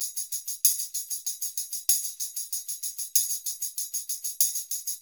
PERCUSSION LOOPS; Sampleheads; NYC Percussionworks; 90 s Sample CDs

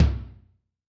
TOM Xfad F, Yamaha DTXpress-II

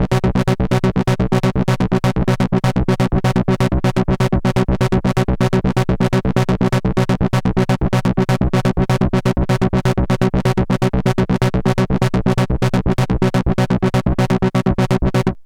PRO5 LFO B 125, SYNTH SEQ, Trance; AMG; Global Trance Mission VOL-2

